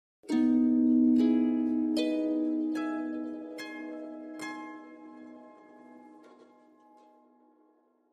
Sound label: Harp Arpeggio Reverb; strings harp